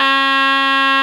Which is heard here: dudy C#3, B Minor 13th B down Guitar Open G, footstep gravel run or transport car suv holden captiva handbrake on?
dudy C#3